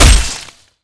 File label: DMG HIT